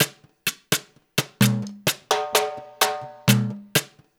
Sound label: LOOP, DANZON, Percussion